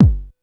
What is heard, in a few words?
KICK, Hip Hop; HipHop Kick Snr Hat; PlatinumPhattCD1; E-MU Producer Series Vol. 8 – Platinum Phatt